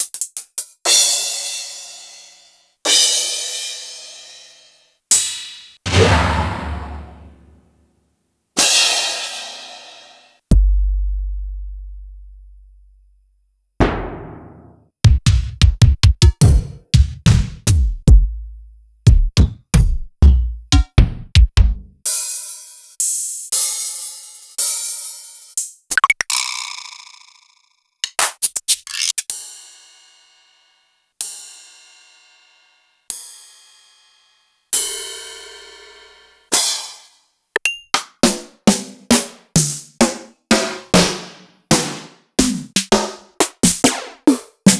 Yamaha RY20

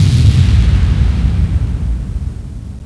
DEEP BASS BOOM; Urban Shakedown

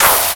Splash; Mazinger Z; Ghoul

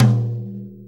Brush Tom; Sonic Foundry; Loops & Samples Drum Tools; Brushes

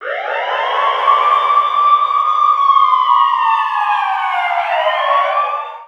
BIG WAIL, TOWN FX; USB Soundscan vol.02; Underground Hip Hop; AKAI

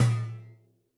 Tung Drum, Yamaha DTXpress-II

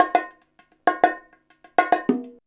BONG, BONGO 100, Modern Percussion Loops

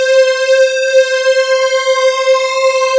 PAD C4, SYN PAD; MKS

